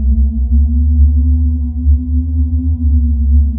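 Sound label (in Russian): mekhanizmy dalekii priglushennyi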